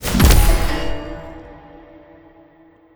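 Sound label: punch boost, attack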